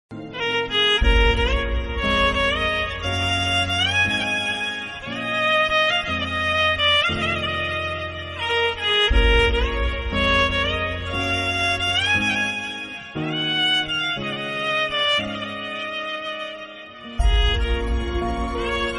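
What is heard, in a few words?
Telugu Sad Violin Instrumental; Ravin Editz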